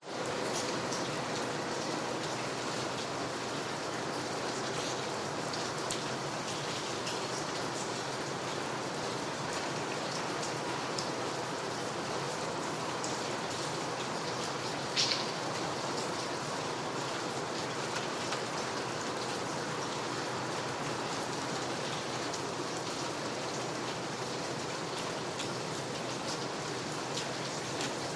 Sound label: RAIN Exterior Terrace Light Pavement Steady City Rumble Stereo; gain walkers